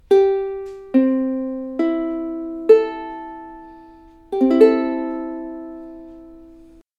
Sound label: ukulele c reentrant tuning; electroacustico; color natural caoba; takamine